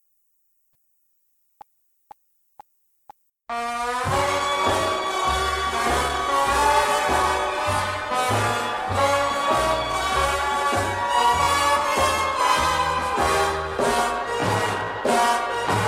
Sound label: Stripper Music; backing track